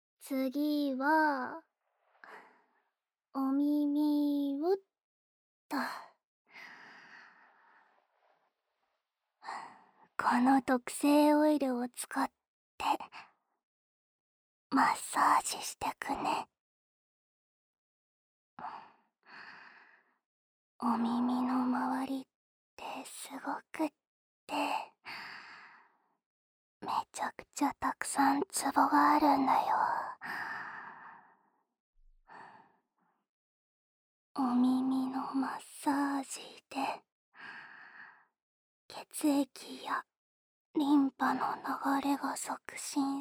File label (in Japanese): 耳のオイルマッサージ; ASMR